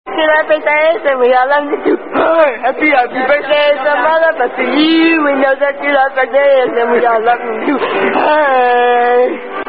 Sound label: Happy Happy Birthday